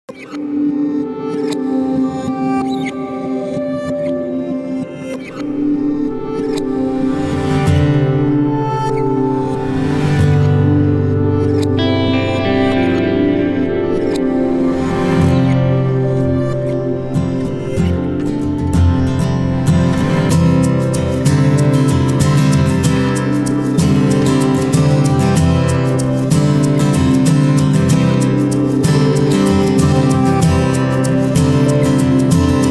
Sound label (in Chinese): 电影音乐; 奥斯卡电影音乐